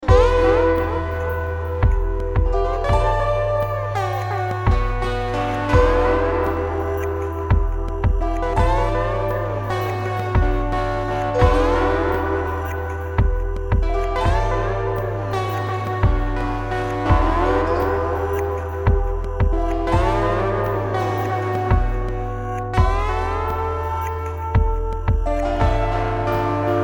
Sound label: slide guitar